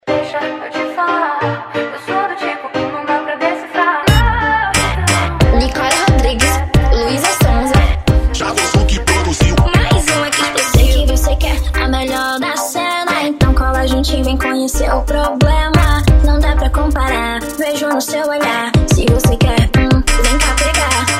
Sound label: funk bass